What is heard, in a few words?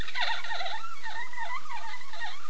cackle